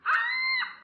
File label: ostrich death; Indev+ 0.3 Portable